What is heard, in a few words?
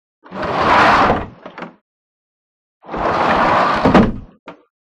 Helicopter Sliding, wessex